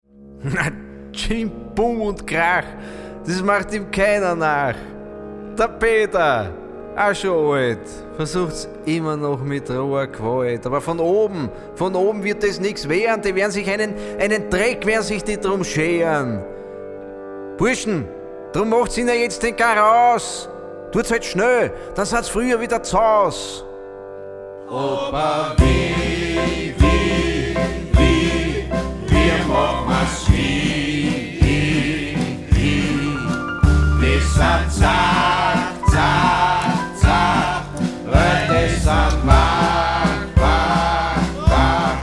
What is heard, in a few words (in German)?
personalien walzer